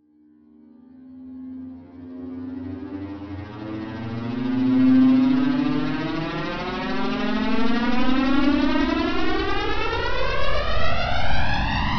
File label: sound design dark deep and flanged drone